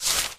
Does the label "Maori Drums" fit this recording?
No